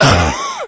death, infected

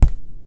ceiling tile impact soft, plaster; Source Engine; video game; physics

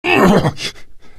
monk pain; Source Engine; video game; ravenholm

